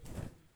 wear

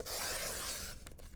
Cut Paper, paper cutter; Micro Pack

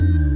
Lil Bass Slide; BB 2HTC Samples Vol 4

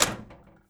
metal button press

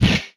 Gun; MMRetroArcadeSoundsPack

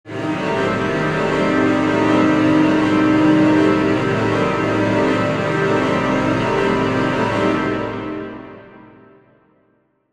Chase Strings Mid, horror strings

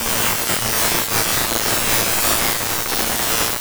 Retro Electric

